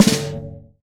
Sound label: SF Snare Ghost, Roland BK-5; Snares